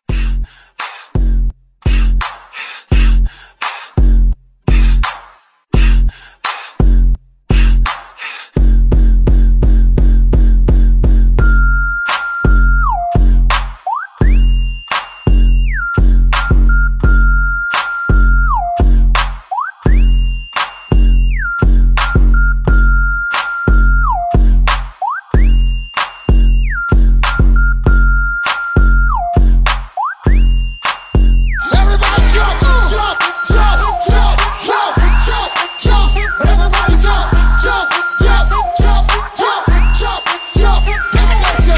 Hype Transition 85 102

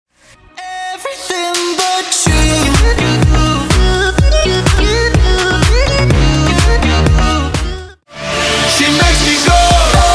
Multi Genre; Megapack

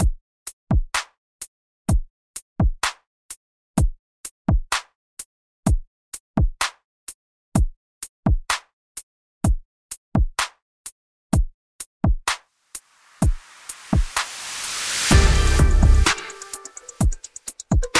He Said She Said Redrum 63

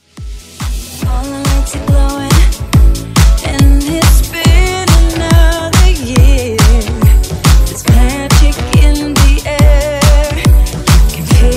Christmas Dance Redrum

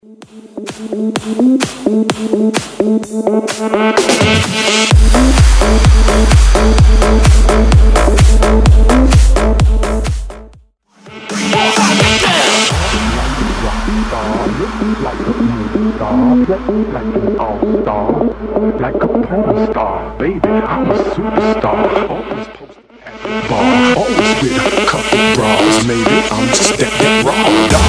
Break Down Bootleg Bounce 128bpm

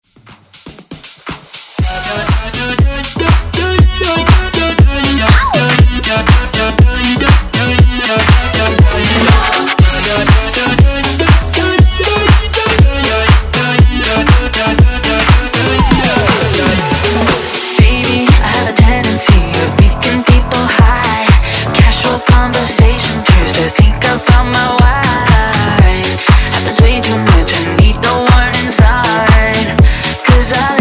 House Redrum

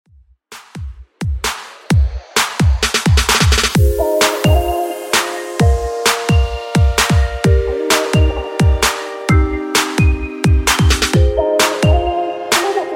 Uptempo Redrum 130 Bpm Clean

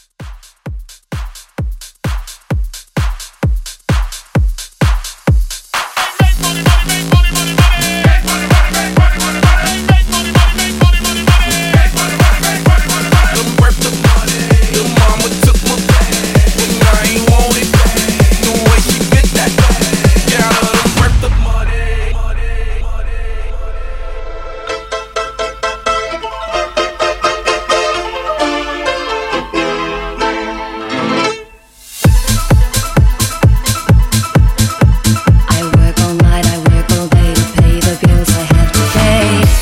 Club Hype 130 CLEAN

